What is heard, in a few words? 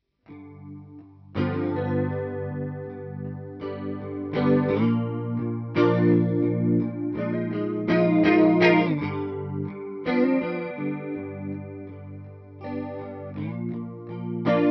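chorus, Guitar; true rock tone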